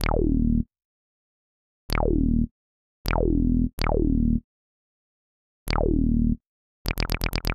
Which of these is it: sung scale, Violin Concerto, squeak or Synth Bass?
Synth Bass